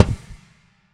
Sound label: Long Crash; Airwindows